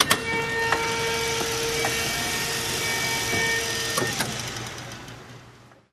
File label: Electric Wheelchair Start, Run and Stop, With Switches